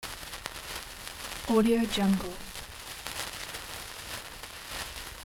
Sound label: fuse burning